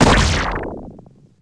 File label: spider slow shot fire; granny; zerosiki; slender